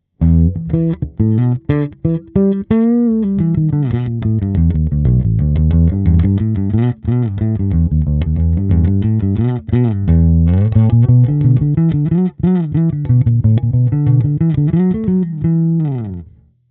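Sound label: Flatwound P Thump Amp, bass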